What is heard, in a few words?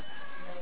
Cat